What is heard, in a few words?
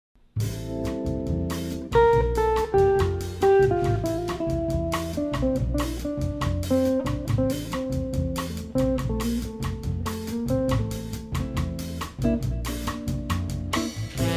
a gentle rain